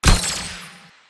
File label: skill shield break